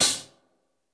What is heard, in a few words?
Rock hihat; Reference Beat